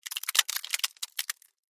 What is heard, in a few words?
Ice Crack, Weather, Ice, Crack; Snow; BlastWave